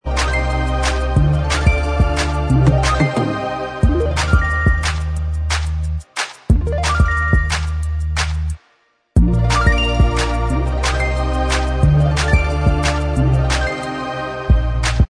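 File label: Inst Track, instrumental track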